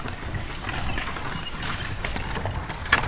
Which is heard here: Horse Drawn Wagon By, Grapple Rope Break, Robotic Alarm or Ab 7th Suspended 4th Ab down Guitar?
Horse Drawn Wagon By